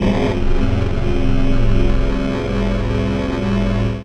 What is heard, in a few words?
syn f0, FAIRLIGHT Synclavi; Best Service ProSamples vol.55 - Retro Sampler